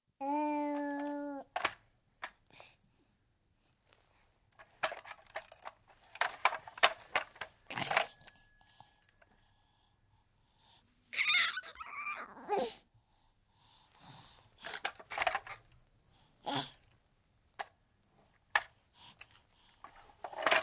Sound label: 11 month old baby boy playing with toys; Babies; Voice; BBC